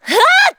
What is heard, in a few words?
shout long; macha